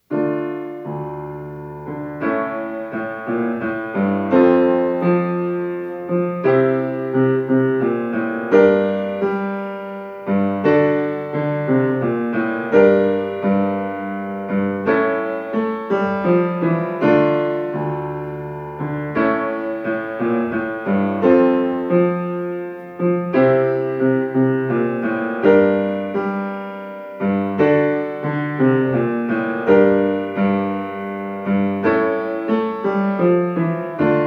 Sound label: Sarabande; handel; musik